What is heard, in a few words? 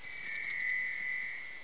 enter; compass2